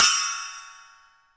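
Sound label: splash, Crash Cymbal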